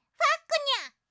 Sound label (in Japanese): ポップアップウィンドウのブロック